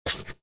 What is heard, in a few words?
undo